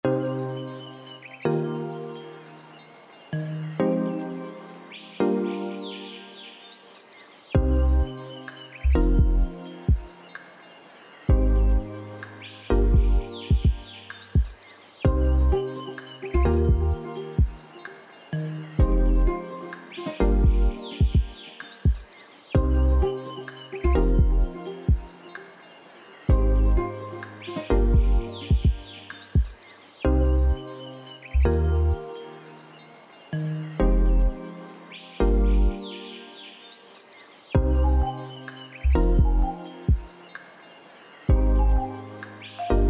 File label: chill nature vibe